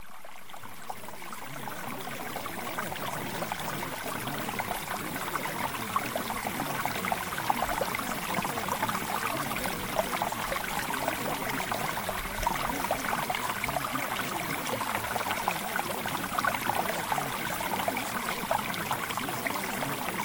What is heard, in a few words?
Mountain Stream; Spiritual; Get Out Of Your Comfort Zone